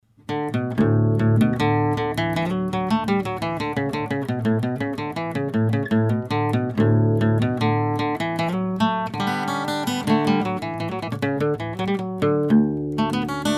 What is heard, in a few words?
acoustic guitar recording; over shoulder 12th fret